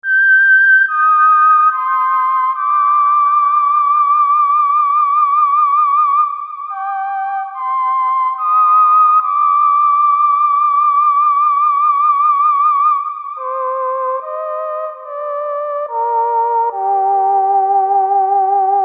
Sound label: Sequence F